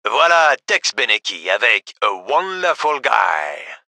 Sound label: music